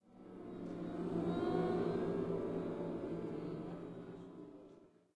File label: red deep jungle